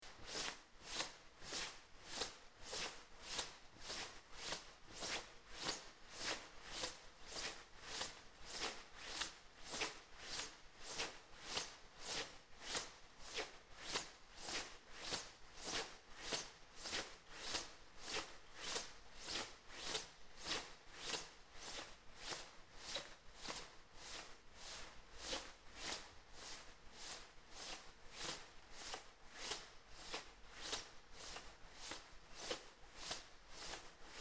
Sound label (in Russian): hodiba v kurtke s shurshaniem; Звуки куртки